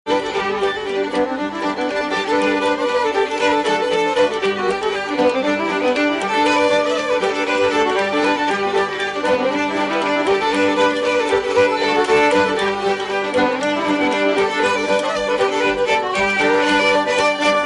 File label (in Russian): skripka, ringtones